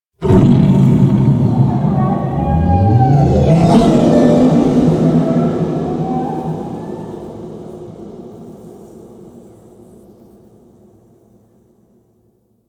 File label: Shrill Screams; Ambience; Man In The Wall